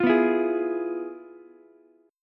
F Minor Major 7th Flat 5th Ukulele; FmM7b5 strummed; JGuitar

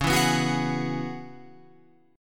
C sharp Minor 11th, Guitar Open F; C#m11; strummed; JGuitar

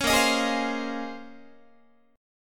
A sharp Minor 11th A sharp down Guitar, chord; strummed; JGuitar

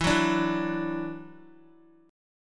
E 7th Suspended 2nd, down, Guitar; E7sus2; strummed

